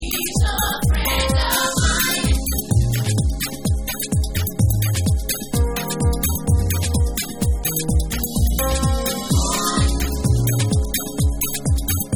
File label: Instrumental Dub